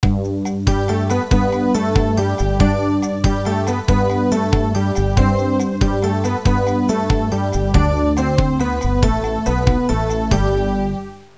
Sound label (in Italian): suonerie contemporanea; musica contemporary